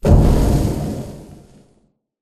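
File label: Explosion Rumble Interior Distant Punch; Boomtown; Titanfall 2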